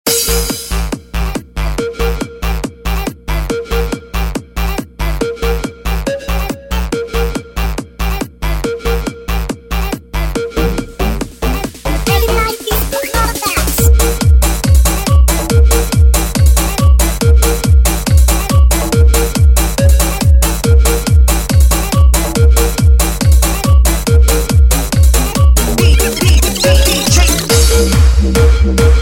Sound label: dance music